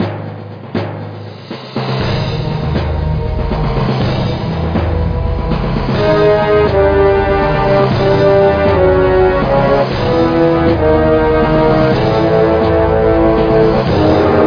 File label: Voice Start Music; Jimmy Neutron Boy Genius